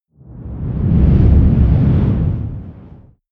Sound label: whoosh deep squall heavy